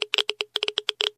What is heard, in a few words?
geiger; STALKER; IWP